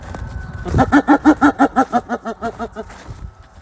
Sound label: Hyena laugh